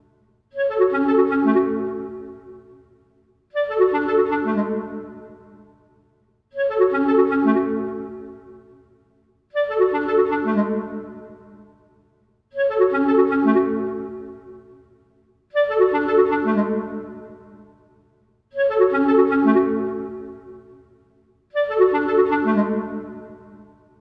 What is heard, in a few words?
Clarinet